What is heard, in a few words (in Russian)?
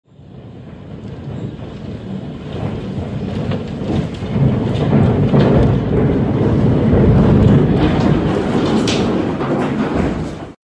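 Звук записан в разрушенном здании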